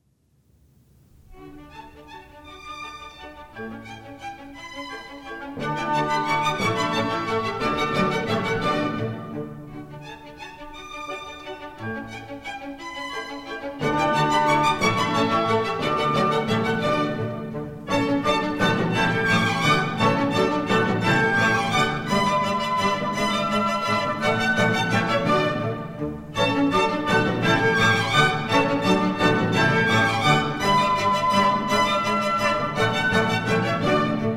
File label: German Dances, D major; Mozart; Dances and Marches